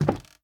Wood soft; Devour 2021 gamerip pc macos

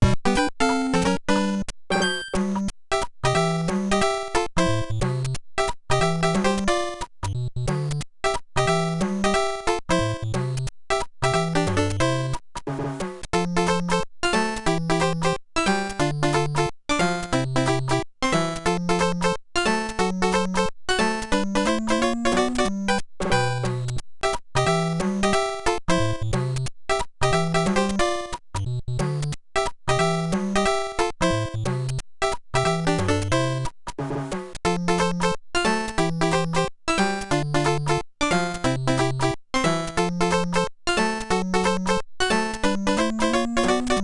Ground Game Fast

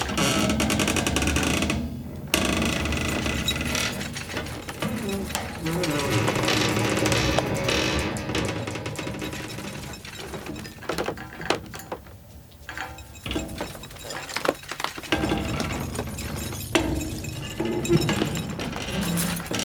chandelier rattle

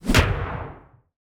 House Attack Impact; android; Portal Quest

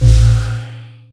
Cube Appear; 7 Wonders of the Ancient World

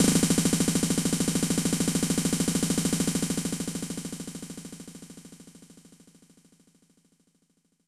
Drum Roll; Super Famista 2; SNES

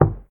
Wood soft

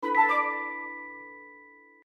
Ingredient Awake; My Cute Commissar

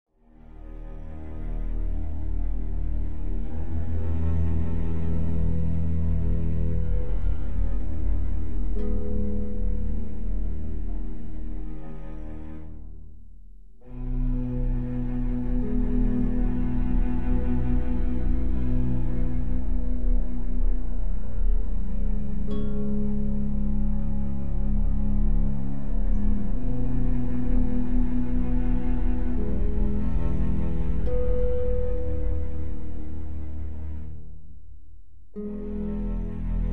Tense

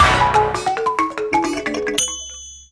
Food Collected; Jungle Banquet; madagascar; ps2

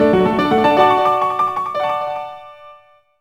Piano; Elebits: The Adventures of Kai & Zero; Nintendo DS

